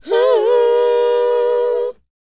WHOA F#, Vocal R&B & Dance; ILIO; Vocal Planet

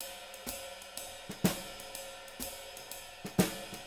SWING, Living Drums; Sampleheads; Peter Erskine Living Drums

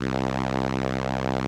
SOLINA, String Machines

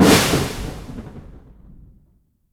Ambush, Effect; Yamaha DTXpress; Effect 1

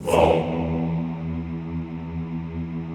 Classical Choir Hmmm E1; Best Service; Extended Classical Choir

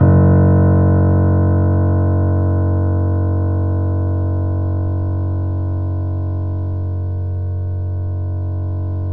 3D Pianos Yamaha C7; E-MU Producer Series Vol. 5

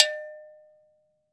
afro ago, afric ago go

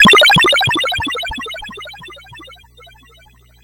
C64 Decintigration Gun; 8-Bit; Chiptune; Commodore 64; FX

